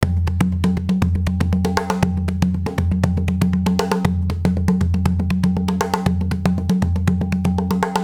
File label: insert sat